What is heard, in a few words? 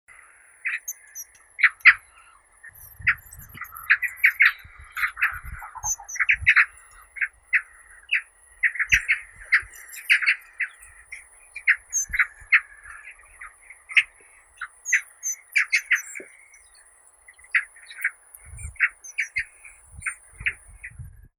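pallas's rosefinch, carpodacus roseus; chechevitsa sibirskaya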